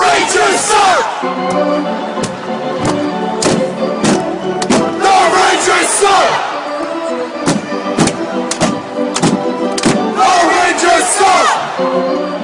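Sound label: rangers chant, football songs and soccer chants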